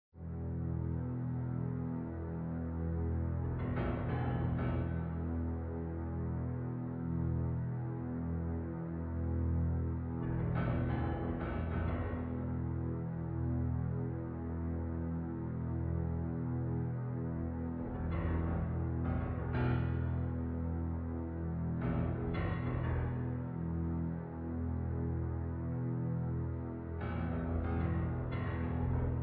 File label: game, bgm; fish; tower client